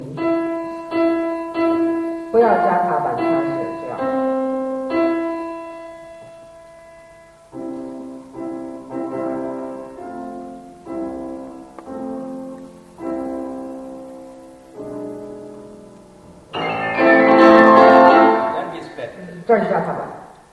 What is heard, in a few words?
chopin; Chopin. Etude in A Minor, Op.25 No.11.; 开始时不用踏板